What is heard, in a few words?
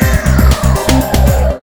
respin animation; Amatic; Wild Stars